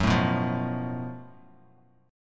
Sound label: F sharp Minor Major 7th Double Flat 5th, Bass Standard; strummed